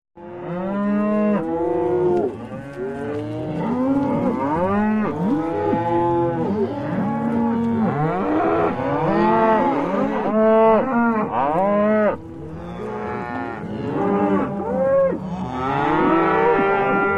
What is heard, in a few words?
Cow Moos Movement Clo